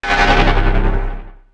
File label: quad fire